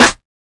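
2000s verby acoustic snare g sharp key, steel snare drum tuned to the G# note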